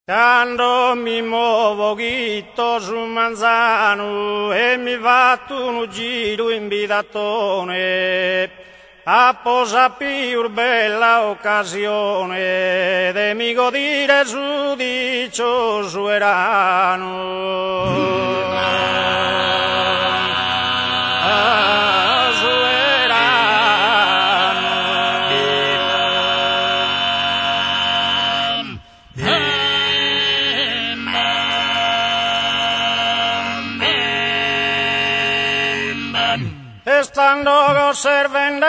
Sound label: passu torrau tenore